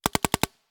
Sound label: Paintball Gun; Sound Ideas; Guns